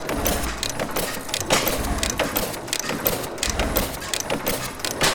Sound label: gas engine slide click metal machine gear gears crank rhythmic, power museum; coolspring; clack; sonniss gdc